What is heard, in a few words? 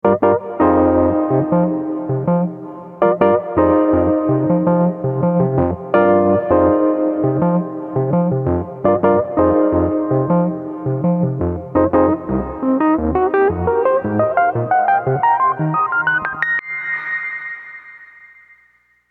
plate, wurlitzer 200a; BigSky